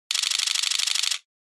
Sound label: Camera Phone